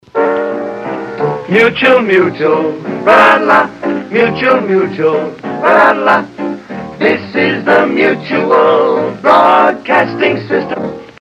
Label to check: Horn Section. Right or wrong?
Wrong